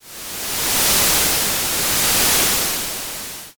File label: blizzard